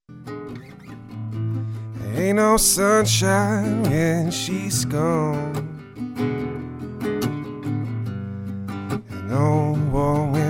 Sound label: nylon string